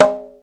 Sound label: conga slp, Yamaha TG-500